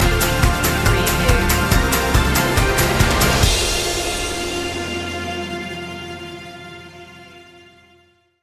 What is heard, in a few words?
upbeat country fun stinger